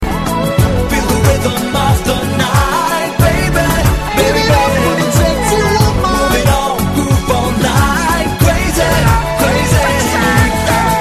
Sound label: hip hop song tone ringtone